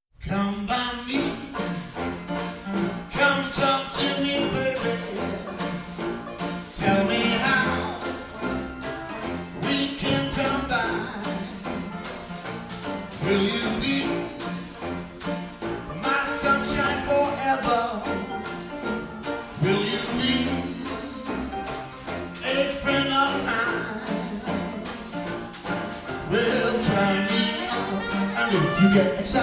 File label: Washboard Band